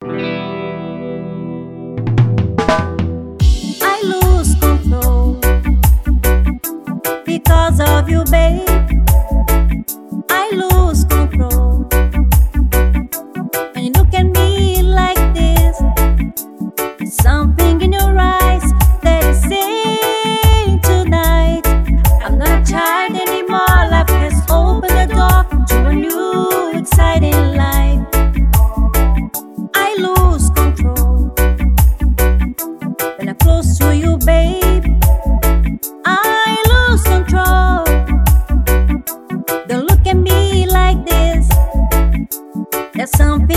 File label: reggae